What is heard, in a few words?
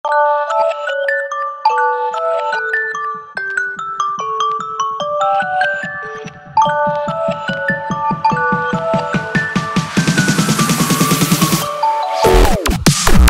Hardstyle REMIX